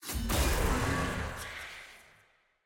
map click

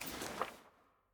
ui record next click; jinx

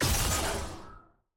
skill hit; naafiri skill 1

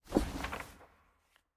record collection return click; vi

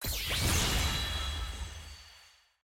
map click; lux

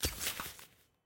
transition map open; tft; dragon

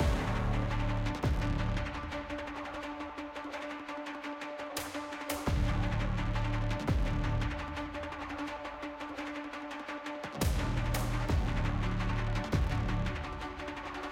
mus banter

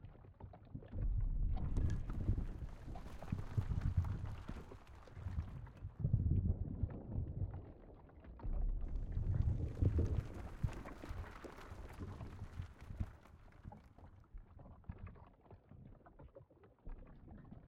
amb demacia rubble

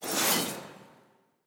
visor open; regalia lobby

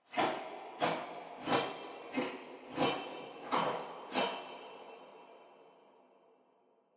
walk creep

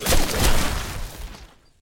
action shrapnel

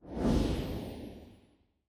loot button crafter cancel click

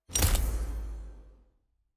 loot reroll populate

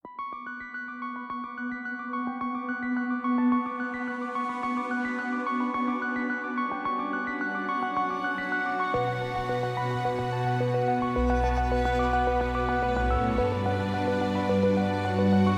music splash; starguardians 2019

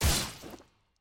aah; shaco

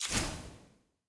ui iron burst

